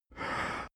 male inhale

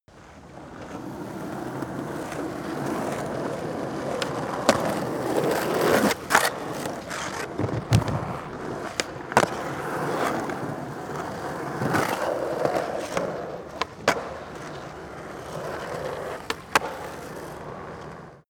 skateboard drive multiple sequence

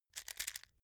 match take out

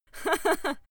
female laugh haha short

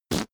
whoopee cushion fart short

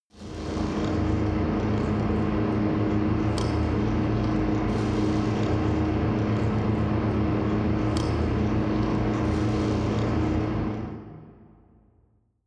machine room wet

